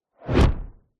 magic spell whoosh ball of energy imcoming hit thud; zapsplat